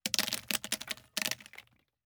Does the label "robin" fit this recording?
No